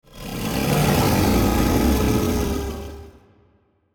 kaiju juvenile rage roar; biogenesis

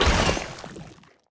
mutation equip; Stellaris; grand archive

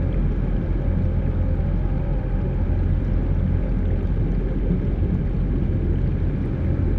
colossus muzzle; aquatics

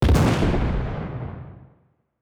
kaiju titan hit explosion flash; Stellaris; biogenesis; weapons

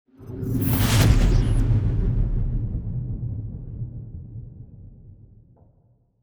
cruiser move; aquatics

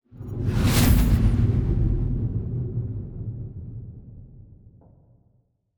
battleship move; aquatics

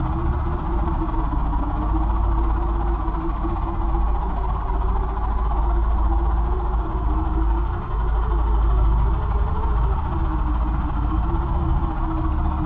shroud creature idle; Stellaris; utopia

